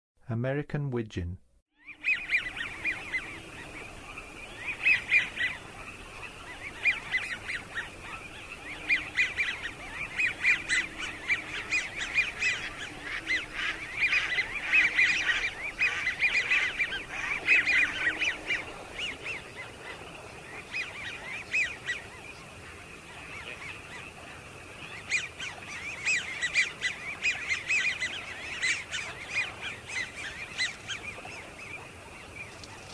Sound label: American Wigeon, Songs of Birds; North America; Western USA; Western USA Songbirds